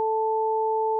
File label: tone stereo